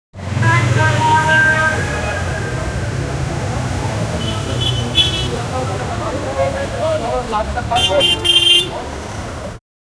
marketplace in chennai; marketplace in chennai wi; urban

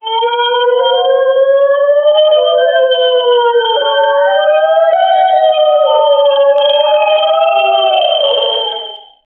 electronic music; eurovision switzerland

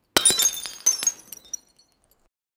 sudden loud glass light

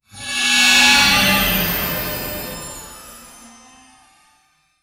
magical energy flow

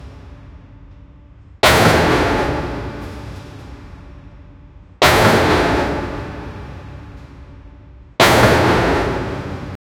pounding of big heavy met, factory

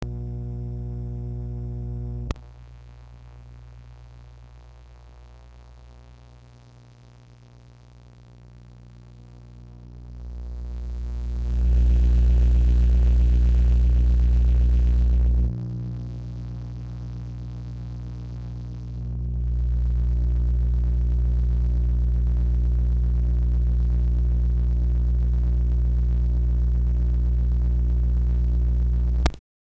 a small led light flicker

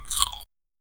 eating sipping

